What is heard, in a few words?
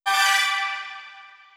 Achievement; MLB Home Run Derby; Android, iOS